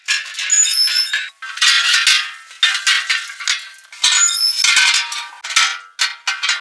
lamp; Mafie 1

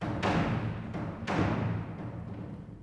people; Mafie 1

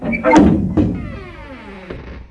wood op; Mafie 1

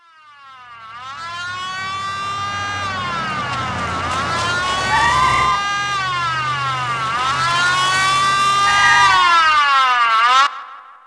up police; Mafie 1

